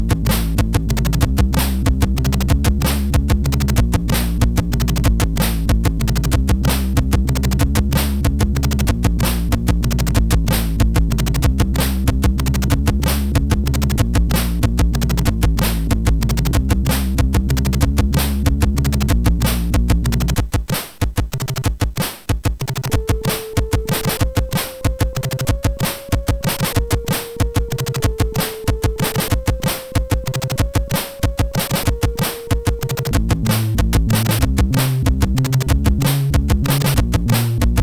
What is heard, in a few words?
Trance, MOS6581R4